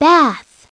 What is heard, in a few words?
bath; SoftKey; WordBank